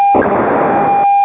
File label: flex, scanner